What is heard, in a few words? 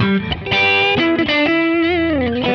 Lead Boogie E